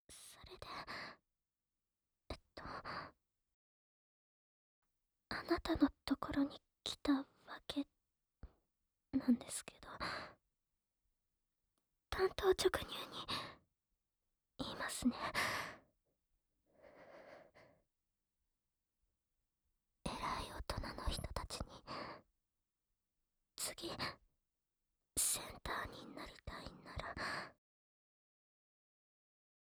ディープキス; 脳とりがー; アイドルをレイプして愛奴隷にするまで。; 清純派アイドルが我が家に

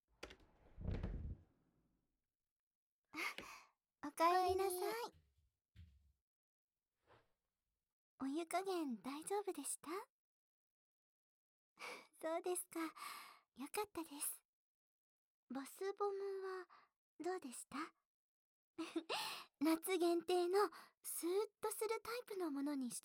耳かきされて

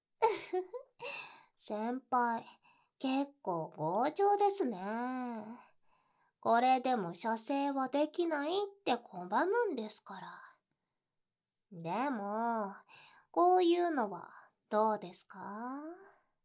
耳舐めしながらカウントダウンで; 精液お漏らしして下さい

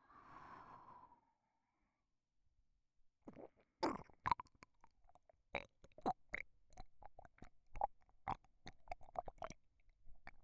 耳舐め, ASMR; SEあり

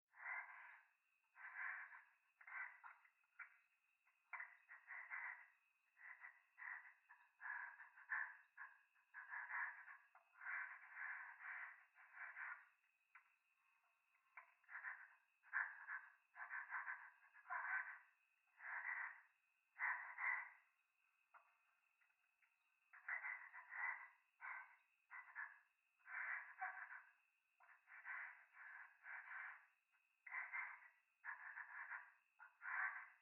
トイレ 右耳快感吐息 山奥夜

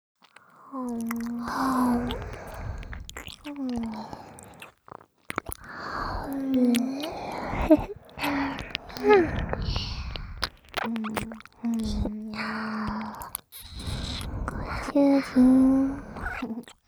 ディープキス＆左耳舐め; アリサ

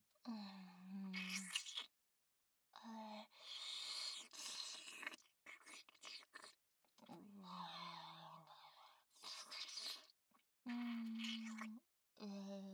つま先舐め, ASMR; アドリブ集

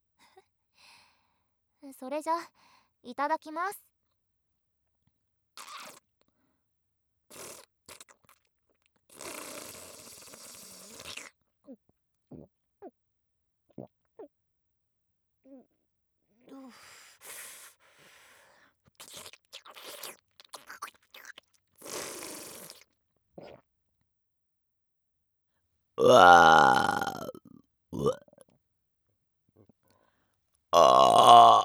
ザーメンゲップ

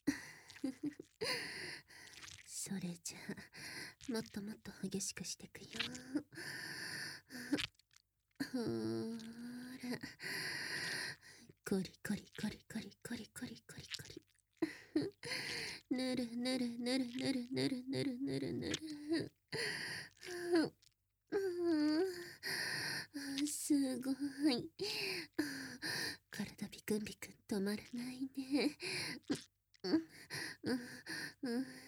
吐息+乳首弄りループ（激しく）

